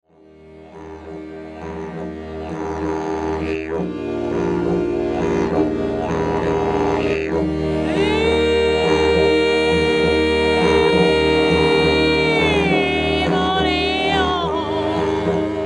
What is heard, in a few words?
blues